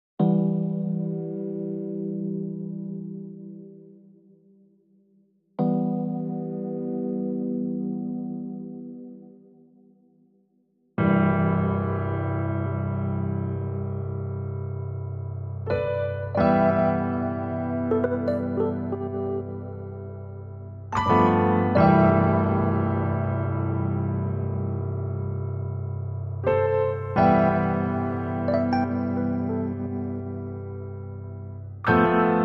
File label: Reduced Piano and Keys Only